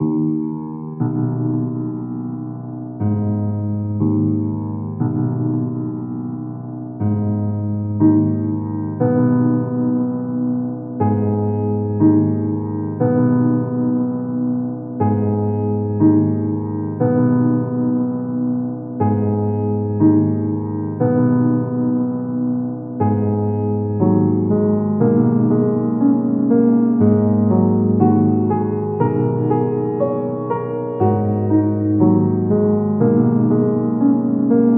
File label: Piano